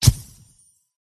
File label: cat attack contact middle